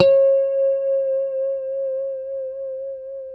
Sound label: D5 pick harmonics, guitars; Spectrasonics; Hans Zimmer Guitars VOL-1; JC-HARMONICS